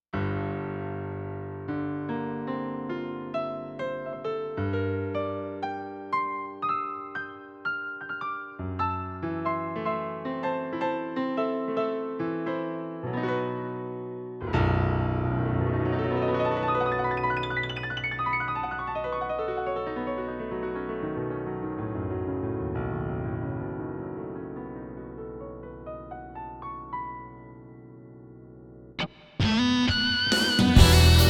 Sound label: Piano Meet Guitar